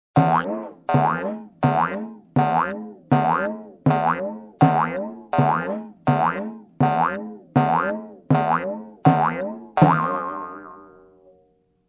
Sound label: Comedy Spring Jump with drum